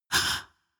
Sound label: inhale female